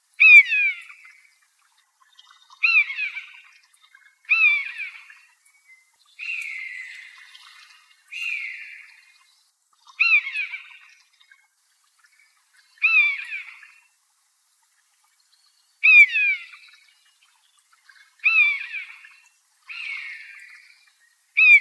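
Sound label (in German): Mäusebussard, Vogelstimmen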